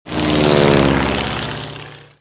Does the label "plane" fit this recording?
Yes